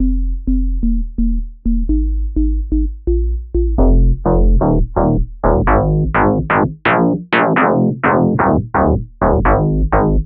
Turbo FM-style Bass

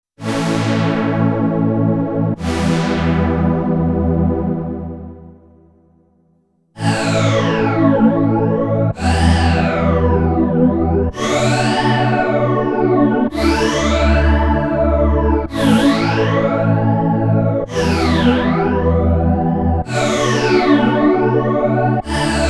KORG Vibrant - Lush Pad

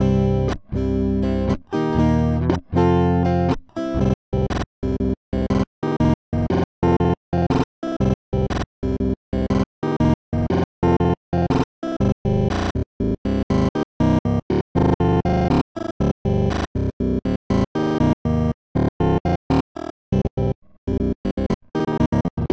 Robotizer on Electric Guitar

